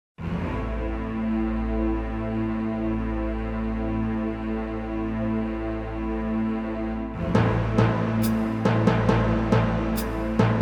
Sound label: prologue - instrumental; City Hunter Special '99 original soundtrack